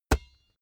basketball single hand catch

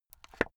watch case small close soft

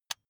electric button drill direction switch lock soft

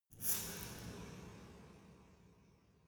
resonator hit single soft

